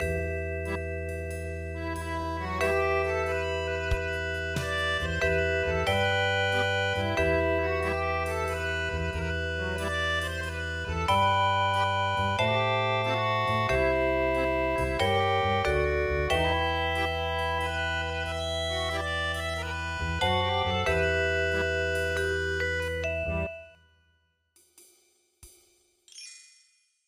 Paris Musette; midi; traditional

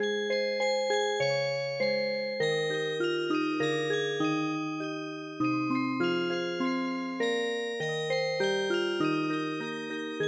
andante cantabile Music Box

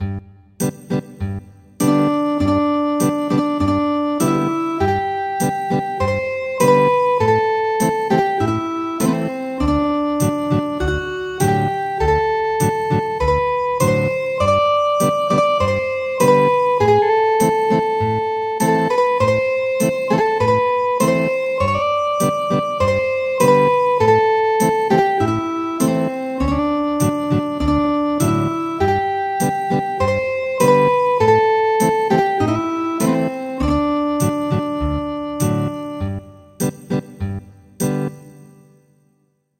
Ethnic Guitar, traditional; The Curragh of Kildare

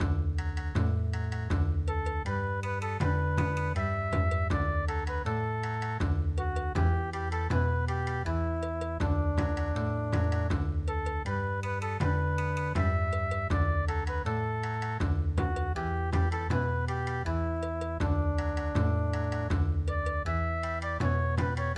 Bon Odori, midi; Schlaf ein mein Blond-Engelein

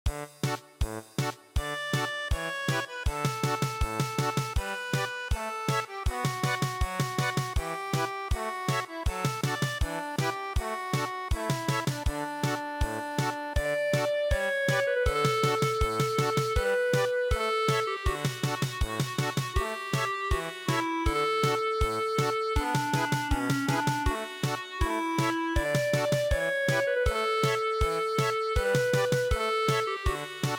German Polka

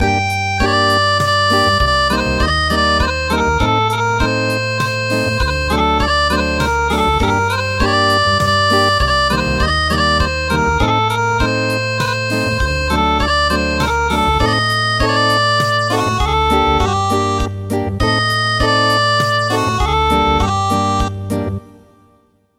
Acoustic, traditional; The Sun Sets

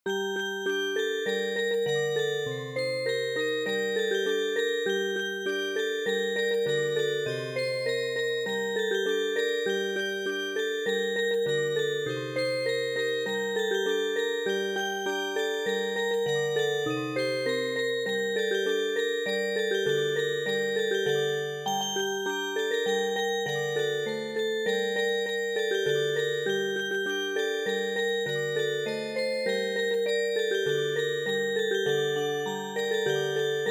Music Box

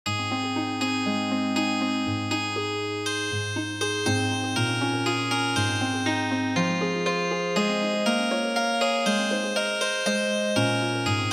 Healing Harp; gae go ri ta ryeong

